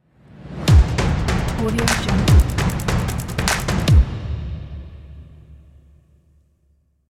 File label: cinematic chase logo